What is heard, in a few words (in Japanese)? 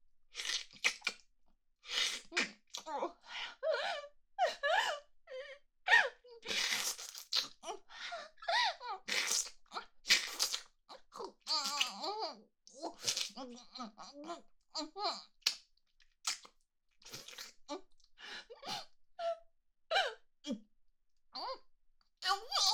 イラマチオ・恐怖に泣きながら精液を飲むも限界で吐いてしまう風な声; 明るい少女系カノジョ